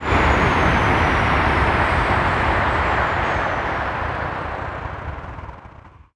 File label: throttle off, vehicles; gmod; tdmcars; teslamodels